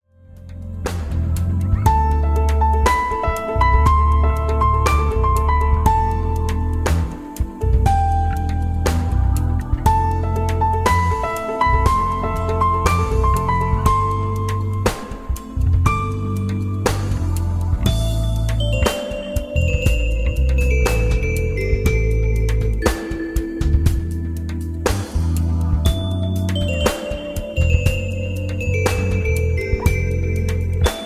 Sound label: Evening chill out